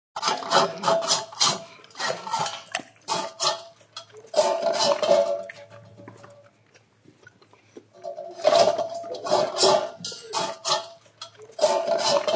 Dg Eat Mtl Bwl, Dog eating from metal bowl; Dog Eats From Metal Bowl 1; Dogs; Animals

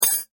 impact metal small; Materials; McKinney Sound

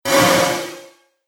Synthetic Crash Sound, impact; Miscellaneous Impact Sound Effects

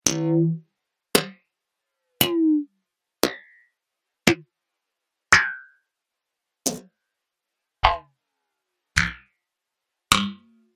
Strange Clicks

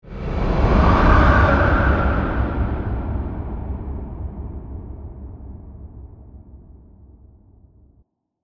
Whoosh Ghostly, Breath, Horror, Transition; Production Element, Imaging Element, Accent; BlastWave

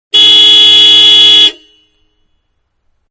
Mid Size Car Horn Long Exterior; Cars; Vehicles; Manhattan Production Music

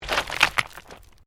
STONES MOVE; Material components for constructing more complex sounds

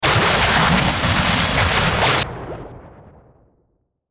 static frequency; Static Frequency Sweep Warble; Production Element Imaging Element Accent Transition; Blastwave; Static Sound Effects

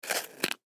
Chalk Box Close; Playgrounds; foley; Blastwave

